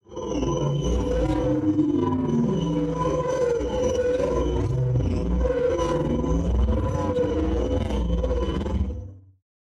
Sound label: spaceship moving